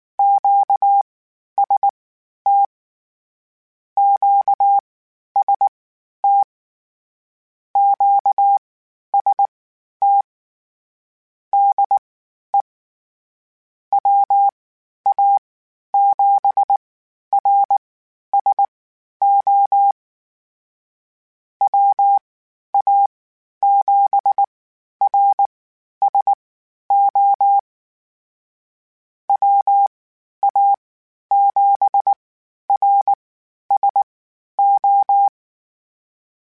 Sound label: QST QST, Morse Code; Mixed; HamRadio